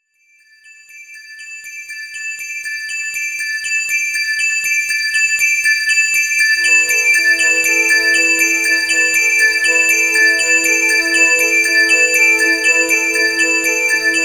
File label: Bells